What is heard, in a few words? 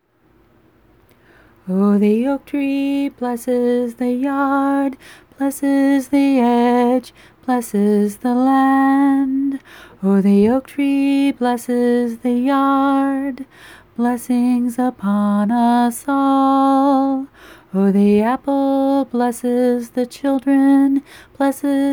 low part kitchen blessing